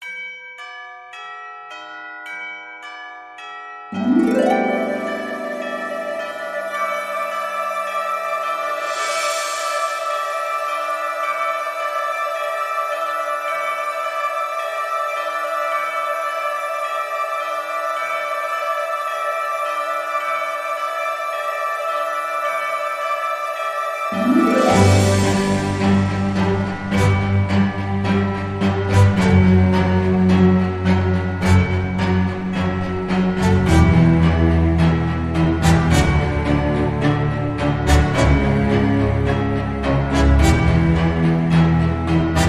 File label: Christmas Bells